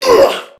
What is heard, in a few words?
Pain Crewmen; Warframe; CorpusCrewmen; CrewmanB